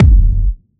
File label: Kick